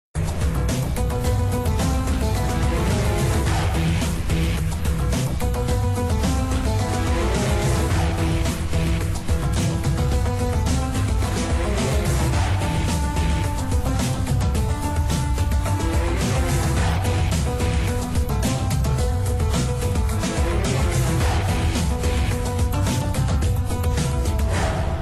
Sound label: Luck Backgound Music